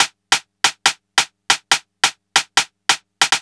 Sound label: Snare, Trance